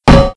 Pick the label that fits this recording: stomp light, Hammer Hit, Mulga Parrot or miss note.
Hammer Hit